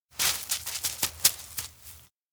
dog approach; koyomi; anemoi

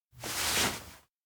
sleeve pull back fast; anemoi

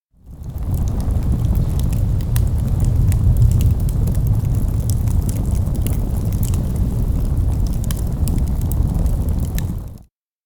forestfire burn; anemoi

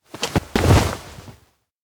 hug; tsuzura mugi awayuki; anemoi

